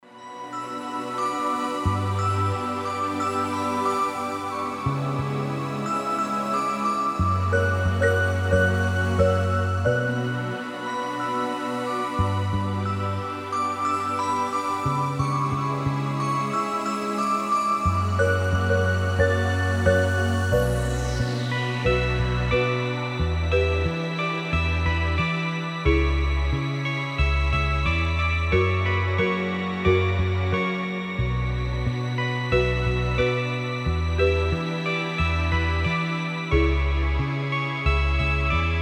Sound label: lullaby rock